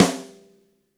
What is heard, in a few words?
Gretsch Snare Room; 1967 Gretsch Kit; One-Shots; Sonic Foundry (Sony Creative Software); Vital Drums